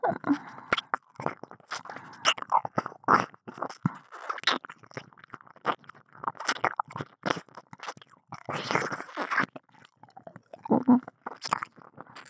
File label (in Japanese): 右耳舐め, ASMR